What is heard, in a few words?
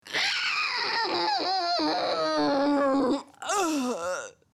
death